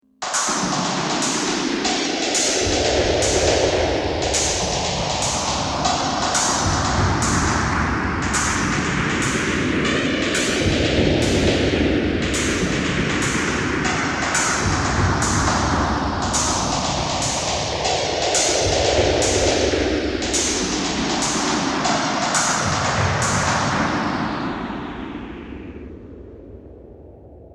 Abstract rhythmic train like sound of metallic cable lashing; Whips; Sound Design